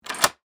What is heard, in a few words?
Door Lock Office, Foley, Door, Lock, Office, Door; Door Closing; Doors; BlastWave